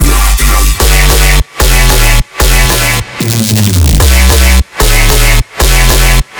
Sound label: farback50 Gm